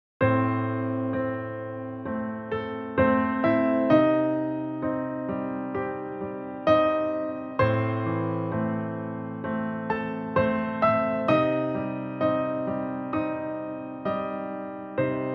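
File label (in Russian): нервная тревога ужас эфирный фортепианный фоновый звук; film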